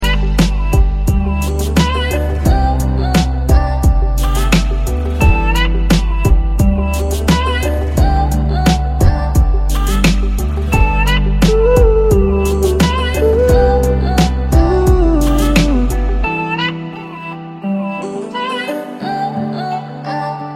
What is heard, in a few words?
Instrumental Ringtone